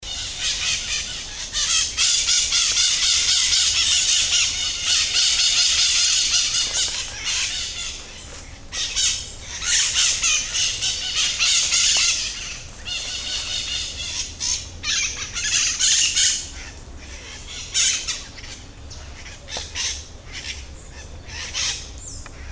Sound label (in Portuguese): periquitao maracana, aves